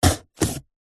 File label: cardboard box knife fast stab into; zapsplat